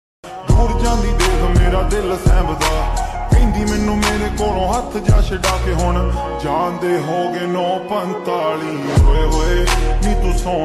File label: slowed reverb ringtone, lofi ringtone, punjabi